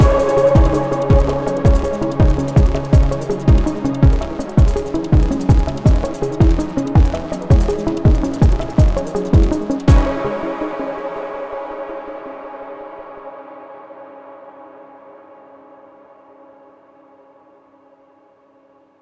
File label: Opening 13 Bar 164 Bpm